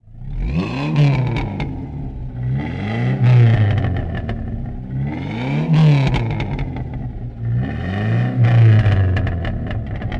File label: rev; Mafie 1